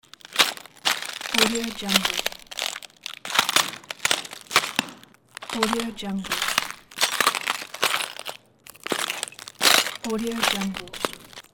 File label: steps on broken glass